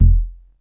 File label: short, Jungle Bass